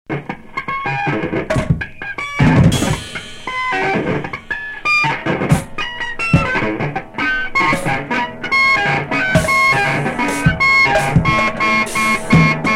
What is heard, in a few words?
Improvisation